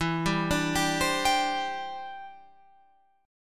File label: E Minor 7th Sharp 5th, down, Guitar, Open F; arpeggiated